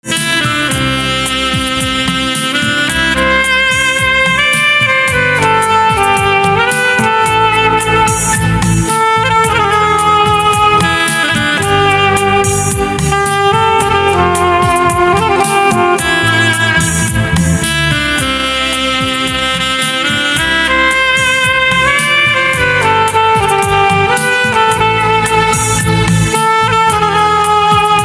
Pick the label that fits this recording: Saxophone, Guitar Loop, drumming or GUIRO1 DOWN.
Saxophone